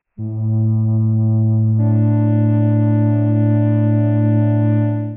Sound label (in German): flöte a d2; Intervalle, die man durch Potenzen von 2 und 3 darstellen kann